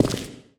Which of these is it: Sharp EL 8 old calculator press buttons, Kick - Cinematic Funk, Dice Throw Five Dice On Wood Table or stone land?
stone land